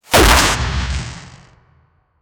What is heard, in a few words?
punch saund efect; fighting